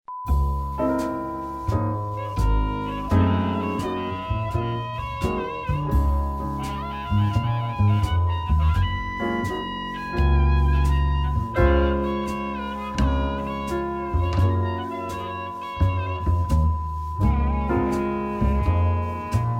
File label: cheerful time